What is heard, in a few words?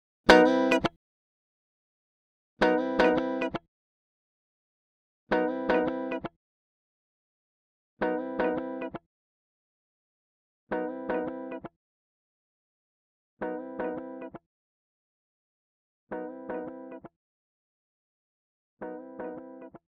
elektrik ping pong; u-he uhbik